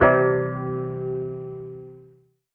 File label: uke, Guitar; Notes